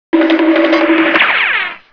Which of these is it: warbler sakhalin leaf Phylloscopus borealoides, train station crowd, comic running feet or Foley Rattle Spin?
comic running feet